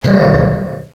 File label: "hmmph", Echo inside caves, underground; DS; ML BOWSERS INSIDE